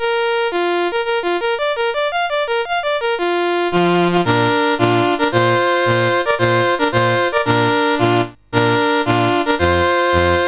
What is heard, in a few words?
Chad, National Anthems, CSG8580R5